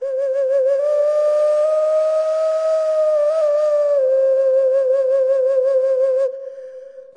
maori wind instrument; WORLD

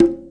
BONGO LO, Electro Soul Kit; STYLE KITS (Hip Hop, Etc)

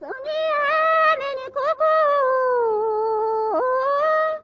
miao female vocal sung, love songs

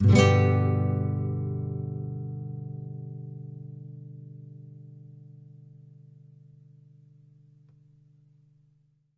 Nylon Chord Gmaj; Nylon Guitar Chords

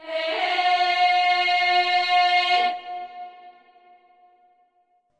Slavic Choir Eb to F 5ths Hey Hey

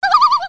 jungle bird; Zoo and Nature

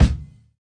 Pearl kit kick; REAL LIVE KITS

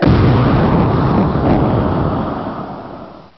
Explosion

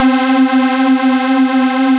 Synthesizer Middle C Notes, Lead

